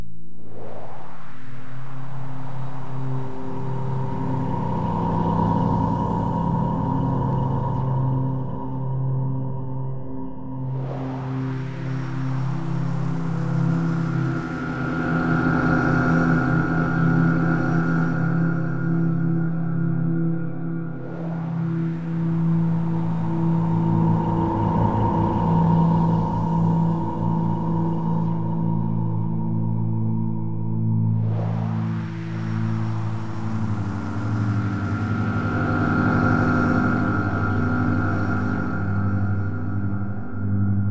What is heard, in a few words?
ambience; OneShot Fading Memory